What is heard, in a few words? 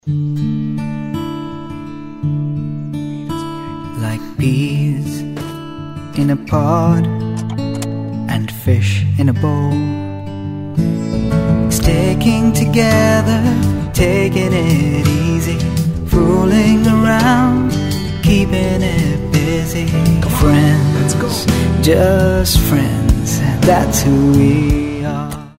TV ad English song; Maruti Suzuki Ertiga